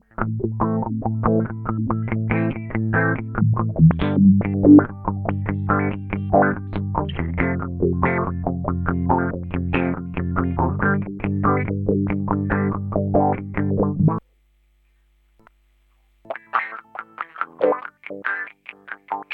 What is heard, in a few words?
Rhythm LP HP, filter fx